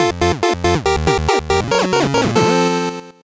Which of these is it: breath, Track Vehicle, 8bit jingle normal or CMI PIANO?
8bit jingle normal